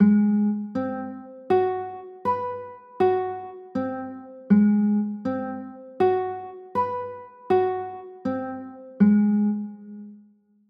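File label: G sharp 7 sharp 9 chord, guitar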